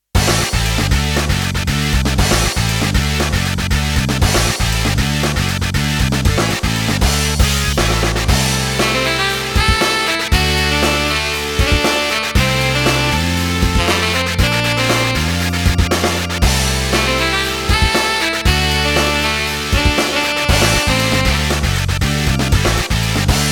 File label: sax